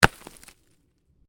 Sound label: Ice chunk heavy, Ice: large chunk of ice lands on hard surface; Snow; Stuart Duffield